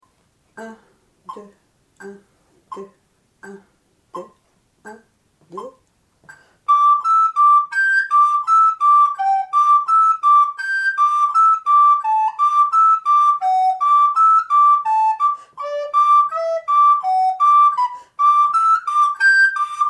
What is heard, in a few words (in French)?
carillonneur, flute